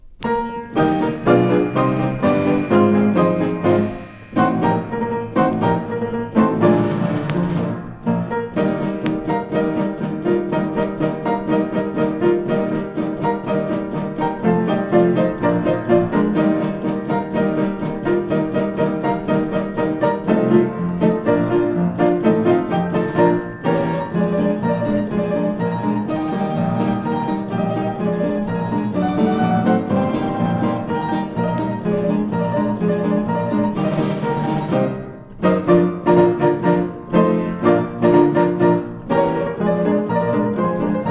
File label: piano x orchestrion; mechanischem musikkabinett; rudesheim am rhein; Poppers welt; siegfrieds